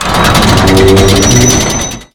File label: battle cry; medieval raid; special mutation; zombiesurvival